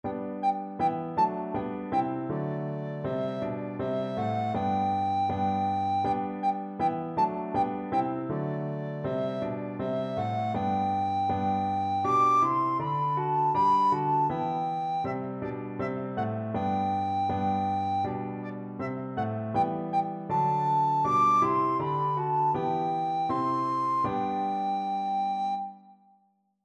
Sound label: good king, recorder